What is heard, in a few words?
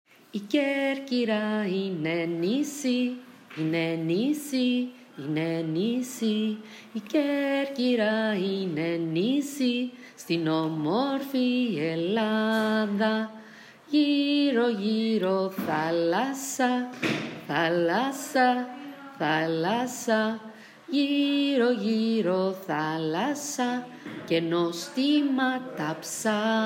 Corfu song